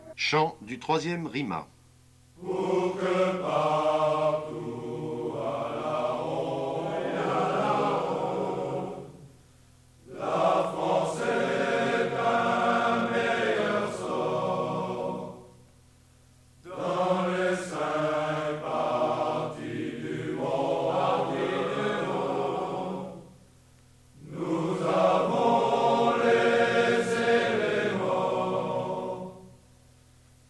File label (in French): Chant; Troupes de marine